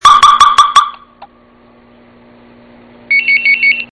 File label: warn